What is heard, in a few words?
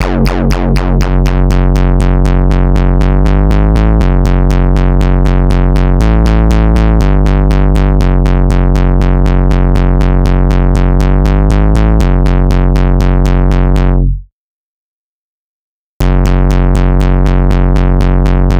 bass